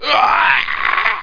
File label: oooww; quake